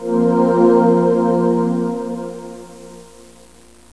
VOX2, SYNTH

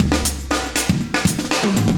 120bpm loops; Sound Effect Library

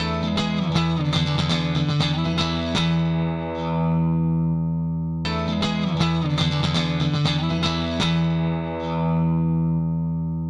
Slinky Melodic Gtr, Rock, Guitars

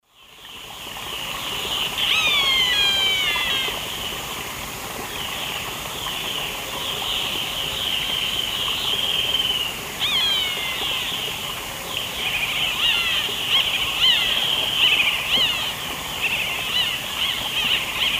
Rostrhamus hamatus, soar calls; S. America; Eastern Lowlands

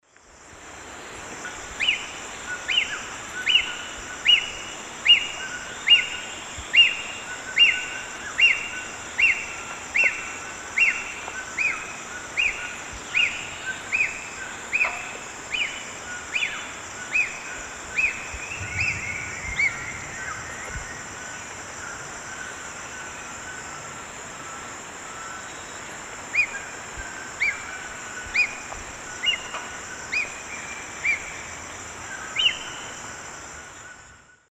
Spizaetus ornatus, calls juv and adult; S. America; Eastern Lowlands

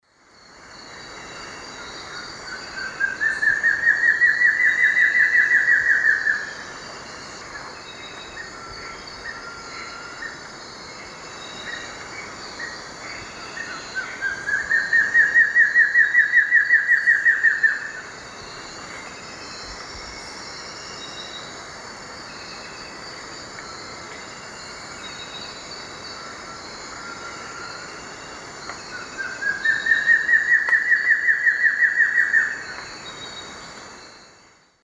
Xiphorhynchus guttatus, song; S. America; Eastern Lowlands

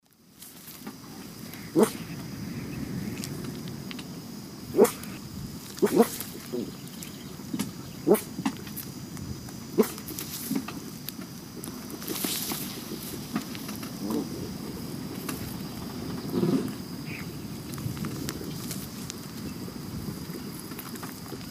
Coragyps atratus, calls; S. America; Eastern Lowlands

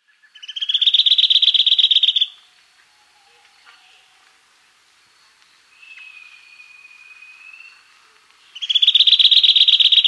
Scalloped Antbird, S. America

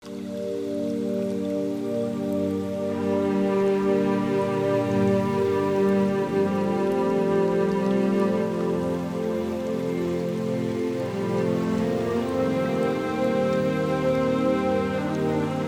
Calming Music, Night Rain Sound

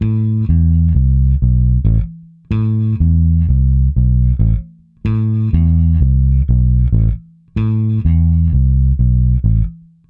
Bass A Funk

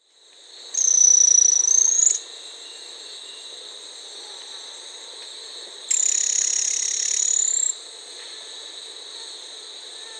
Golden-crowned Spadebill (Platyrinch; S. America

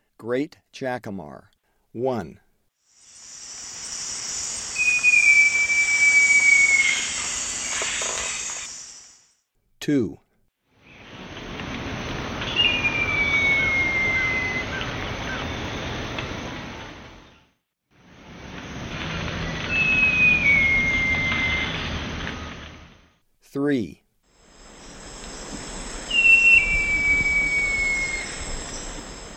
Great Jacamar; S. America; Eastern Lowlands

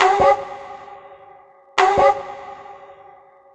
Synth, Techno

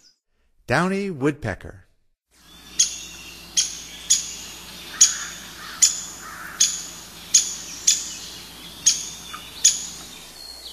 Downy Woodpecker; North America; Stokes

